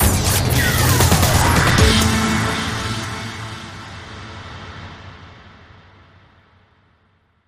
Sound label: Sweep Sting